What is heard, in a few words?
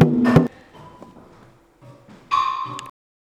Young Drum; Cumbria Remixed Sample Pack